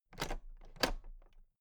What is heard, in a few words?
toolbox latches open regular double